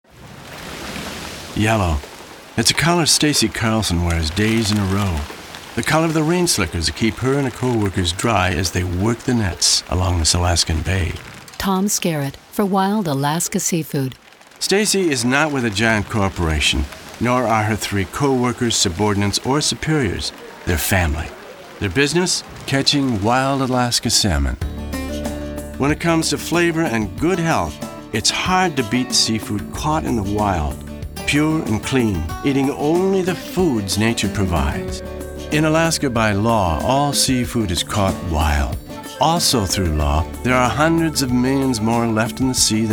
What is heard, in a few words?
Radio Yellow Music; ASMI; alaska seafood